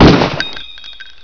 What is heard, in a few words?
sniper bang